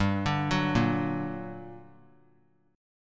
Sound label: G Minor Major 7th G, 3C, 3F, 3A, 3D; arpeggiated; 19,21,21,20